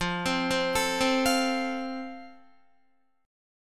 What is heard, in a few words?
F Major, Guitar; arpeggiated